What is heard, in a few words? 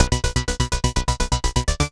Moog arp; Sonic Foundry (Sony Creative Software); Underground UK House & Electro; Bass